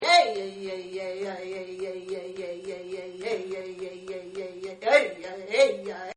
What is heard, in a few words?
Chant, Singing; Voice